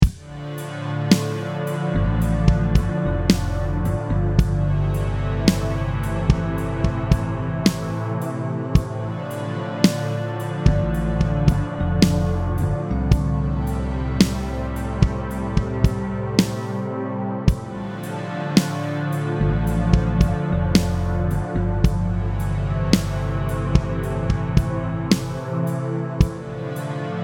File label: ambient